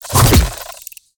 scorpion attack tail fire; smite; npc

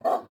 defeat bigdog bark; smite; god daji skin15

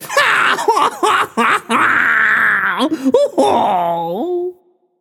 boohoo, voicepack; smite; jorm

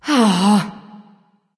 nv sigh; smite; awilix

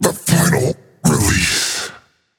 death; smite; gilgamesh

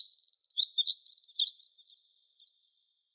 ambience good bird; smite; conquest

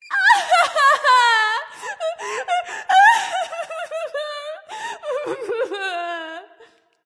boo hoo; smite; voicepack

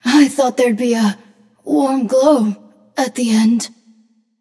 Death; smite; voicepack; nuwa

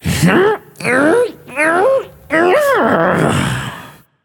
grunt lift, voicepack; smite; jorm

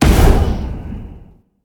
fire; smite; phoenix

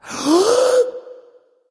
nv breath; smite; voicepack; xbalanque

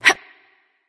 grunt jump; smite; persephone

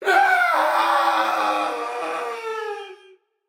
fear, voicepack; smite; jorm

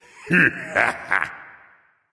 "heh heh"; smite; voicepack; ullr

